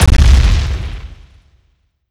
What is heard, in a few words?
Car Land